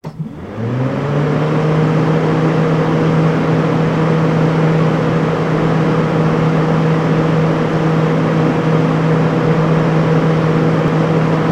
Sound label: van chiller unit on run off